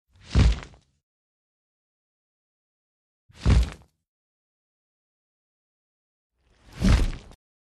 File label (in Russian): Птицы